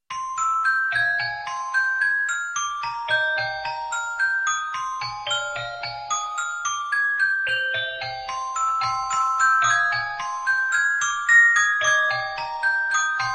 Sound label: the music box